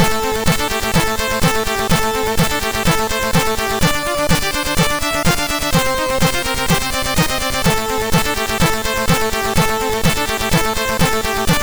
Techno sid MOS6581R4

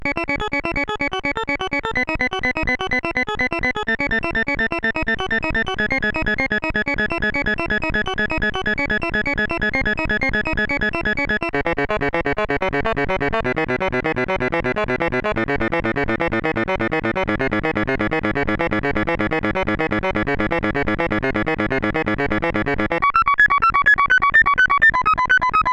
Classical, CSG8580; CSG8580R5; sid

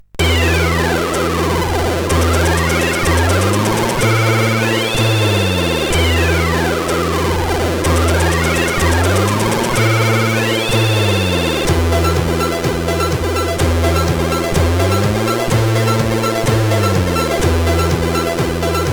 Intro, CSG8580R5; Lazer Intro 5; hvsc

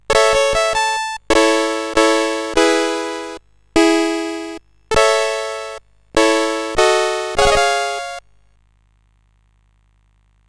sid CSG8580R5; DragonStrike

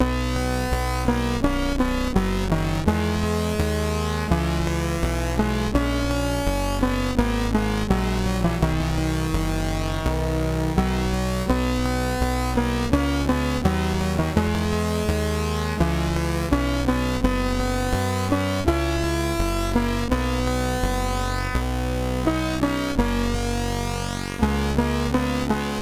Internationale, sid CSG8580R5; hvsc

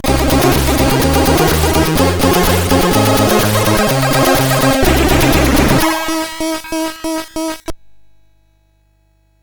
Game Music, sid CSG8580R5

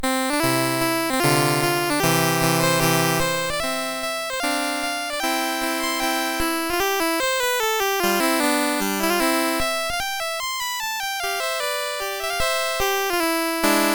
Verstecken MOS6581R2; Joseph A Grau; Les Petits Riens

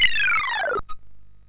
sid MOS6581R2, GAMES; Catalypse

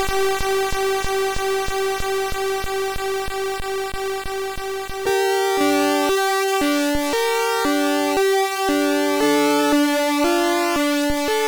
Fantastic tune 2 sid MOS6581R3

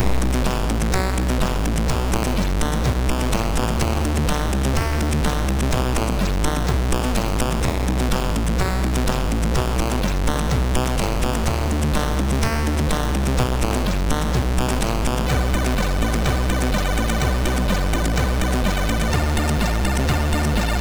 Tune, sid MOS6581R2

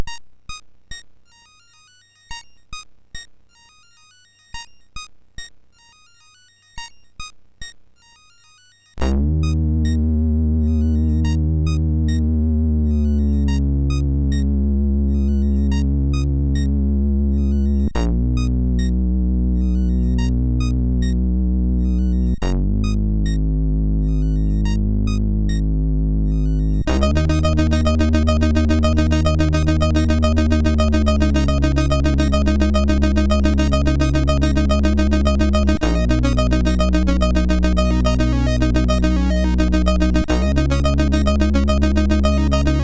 Rain, sid MOS6581R4; Morton Adam

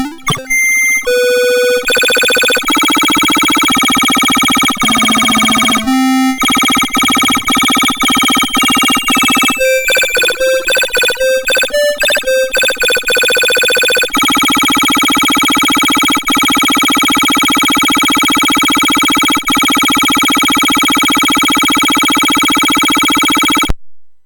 Unidentified, MOS6581R3, SoundEffects

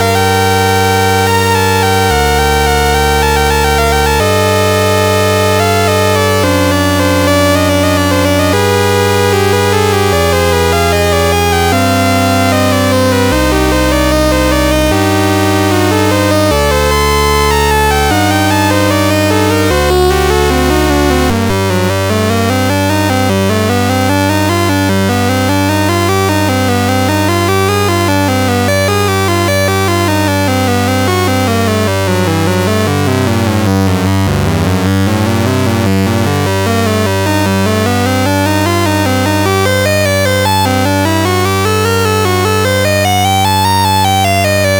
sid MOS6581R4; Fiona Rides Out

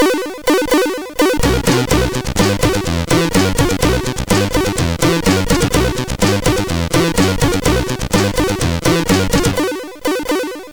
Intro, sid MOS6581R4

